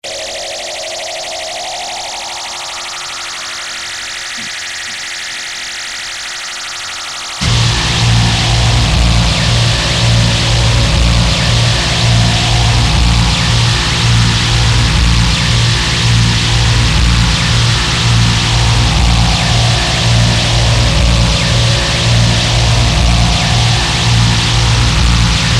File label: Grunt